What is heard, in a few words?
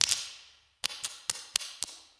rat path; creatures